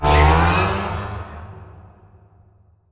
Prop Rack Start Close; Prop Warehouse; Titanfall 2; Boomtown

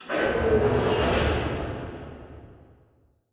Robot Arm Pass Left Deliver Dist; Boomtown; Titanfall 2

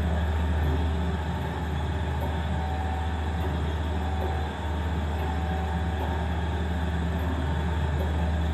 radar tower spin; Titanfall 2

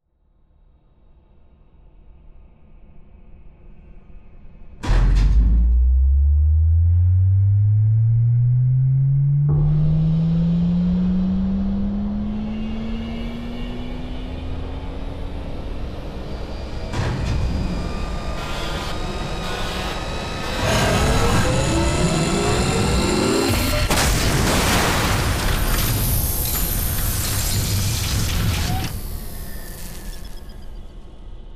hardpoint overload; Titanfall 2

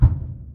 footstep jog; Titanfall 2

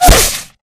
bullet light ballistic kill shot human; Titanfall 2

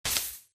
bullet light ballistic sand debris; Titanfall 2

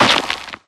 Mvmt FS Jog Dirt Layer; AI Stalker; Titanfall 2

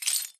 imp bullet lightballistic tile debris; Titanfall 2

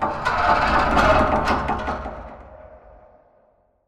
metal stress med; Titanfall 2

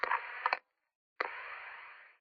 static; Titanfall 2

